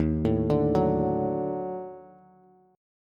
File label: Eb Minor Major 7th Flat 5th, down, Bass; arpeggiated; chord; JGuitar